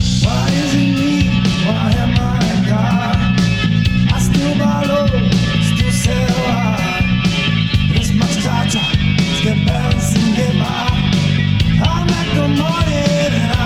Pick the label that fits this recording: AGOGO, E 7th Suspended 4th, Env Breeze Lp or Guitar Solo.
Guitar Solo